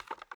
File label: Button; Robobeat